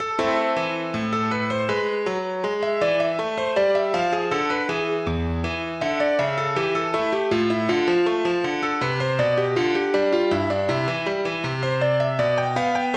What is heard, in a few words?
Allemande in A Minor